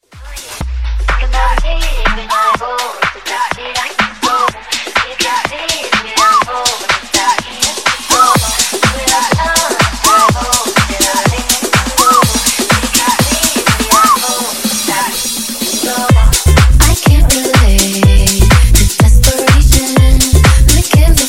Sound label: Funk 124 Bpm